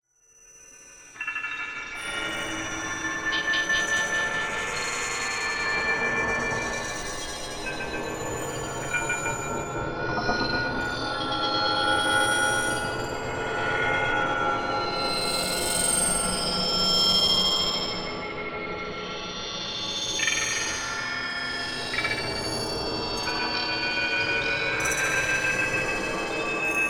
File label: TEXTURE Light Glow; boom library; Neon